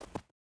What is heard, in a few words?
footstep hide snow jump start; Rust